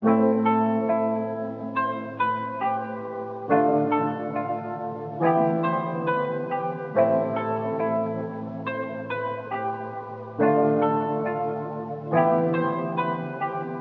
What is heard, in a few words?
Dark Melody Loop - 139 BPM A Min Keys; Loop Stems; Cymatics; 2020 Melody Collection